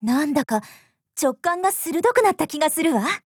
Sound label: levelup; Millau